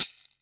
short shaker sound sample g sharp key, shaker sound sample tuned to the G# note; percussion